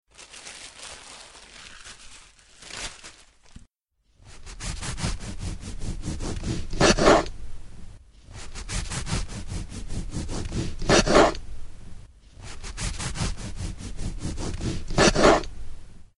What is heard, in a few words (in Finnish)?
leivan leikkaaminen